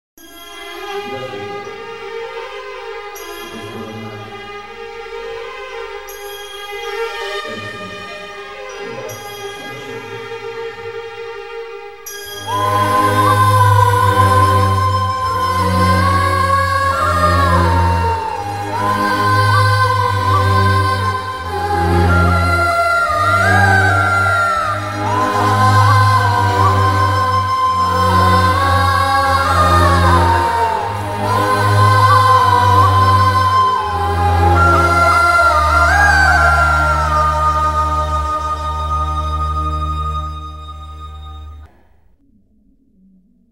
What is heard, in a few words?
sad love bgm background score; padayappa; superstar rajinikanth; ksravikumar